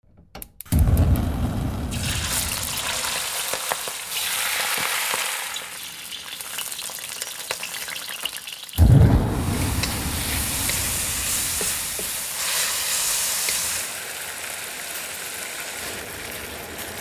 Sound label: Chinese Kitchen